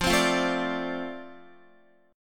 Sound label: F 6th F down Guitar; F6 strummed